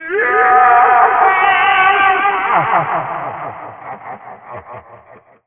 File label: Distress; screaming; agonizing distress; Jabun; Water Spirit; The Wind Waker; Zelda